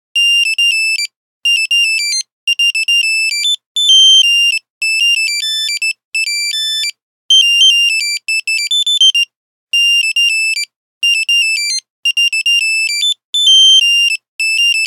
Salsa, Nokia Buzzer; Windows Phone apps

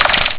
Shutter; Motorola V80

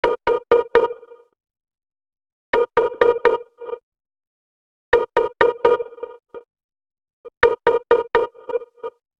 latency, ringtones; Nothing Phone (2)

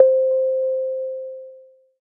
alerttone light; The Light Phone II

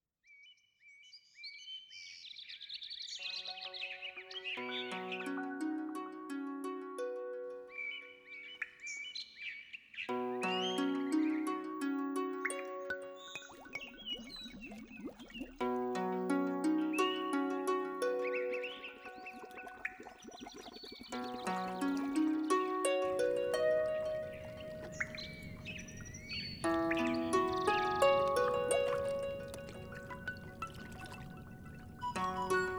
Daybreak, alarms; Lenovo; Tab3 Essential TB3 710I

